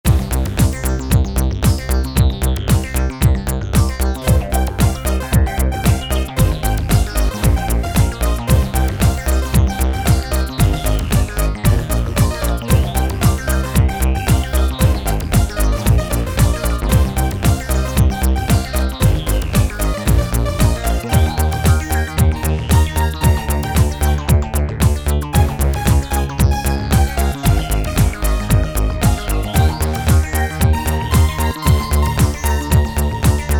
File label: L C R Synth